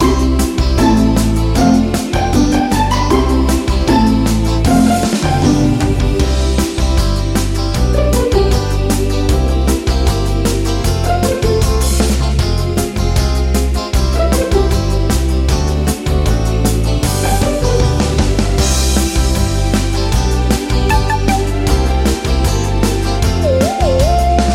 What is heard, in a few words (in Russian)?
минусовку